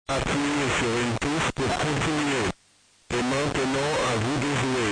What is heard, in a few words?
Super Synthe